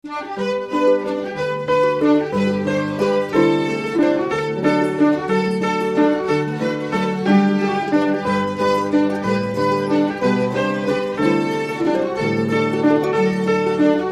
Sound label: mazurka; SHOE THE DONKEY